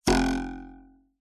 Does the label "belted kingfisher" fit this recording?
No